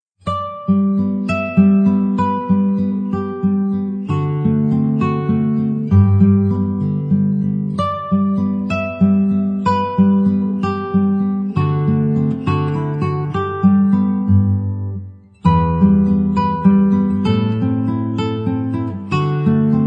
walzer g dur; anonym

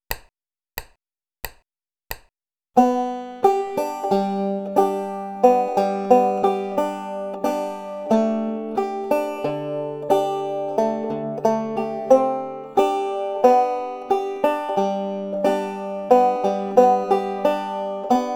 kombiniertes two finger picking

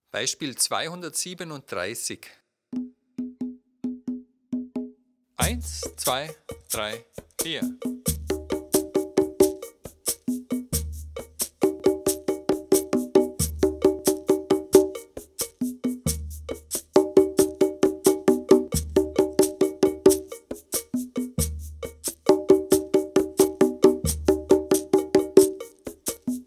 dreierrhythmus

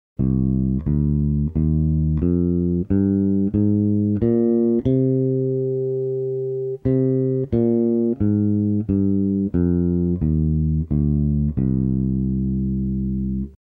aeolisch moll

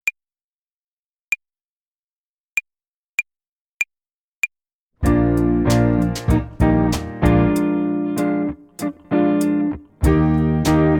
tempo und rhythmus uebung hihat play along

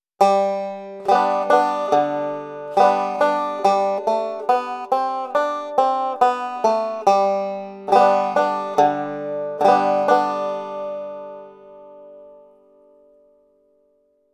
alternate picking und akkordanschlaege

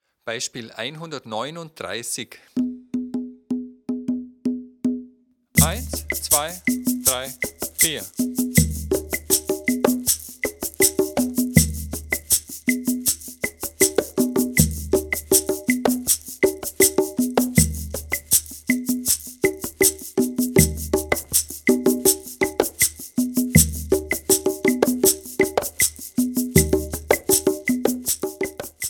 viererrhythmus

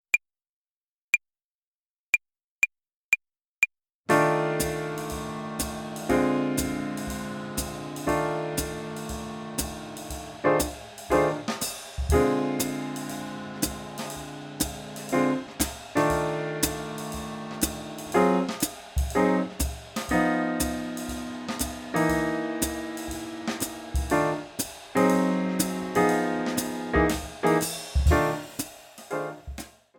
blues tonleiter uebung play along medium

